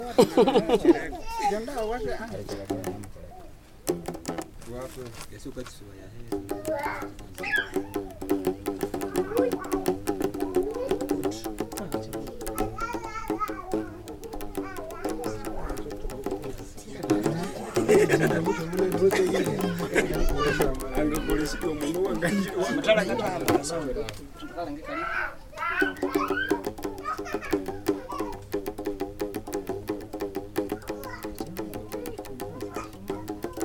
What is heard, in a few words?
stick string solo, Traditional Musicians from Southern Ugandas Rwenzori Mountains